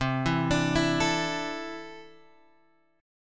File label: C 6th Add 9th C down Guitar Open G